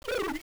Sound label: headcrab alert